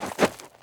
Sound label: Rustle Body Movement Crouch